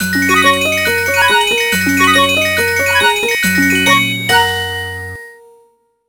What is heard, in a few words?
Collect Fairy